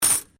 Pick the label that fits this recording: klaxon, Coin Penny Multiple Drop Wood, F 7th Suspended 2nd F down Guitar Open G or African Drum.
Coin Penny Multiple Drop Wood